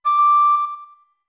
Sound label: Notes Flute D med; D2; OOT